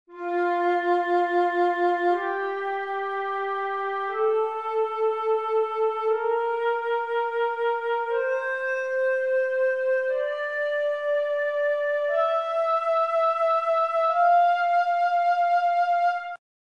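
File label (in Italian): fa maggiore, scala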